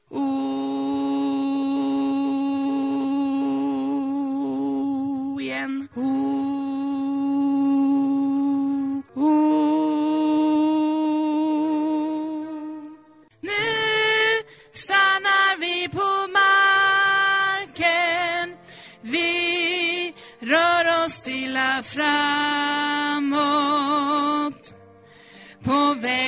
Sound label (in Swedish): alt, stammor